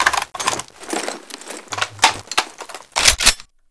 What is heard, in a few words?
mgun reload; svencoop; frostline